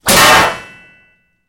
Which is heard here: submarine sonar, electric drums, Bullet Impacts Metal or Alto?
Bullet Impacts Metal